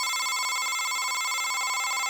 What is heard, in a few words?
Computer Display Beeps Loop